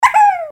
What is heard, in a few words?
woohoo; slime rancher